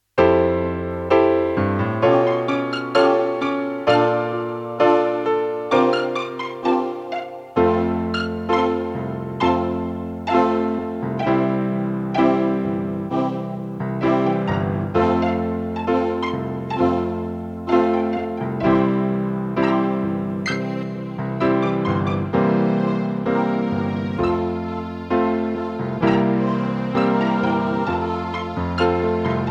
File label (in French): Grillons sauterelles cigales, Playback; S-Active éditions